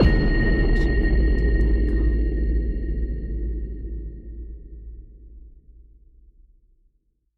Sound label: cinematic hit pin drop; soundscrate